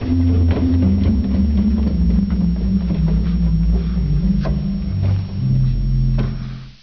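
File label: Subbass 16, organ; Martin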